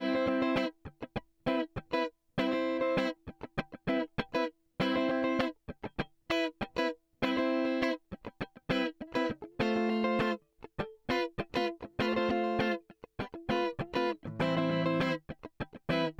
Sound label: Guitar Rythm Comp th30 rat10